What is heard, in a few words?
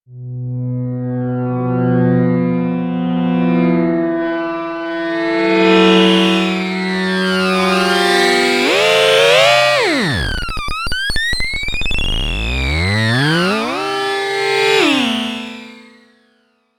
Modulate And Detune